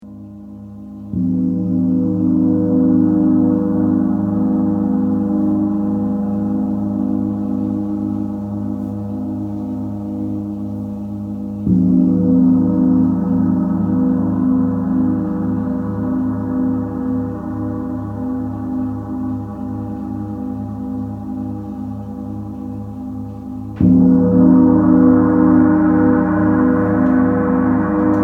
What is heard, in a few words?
Gong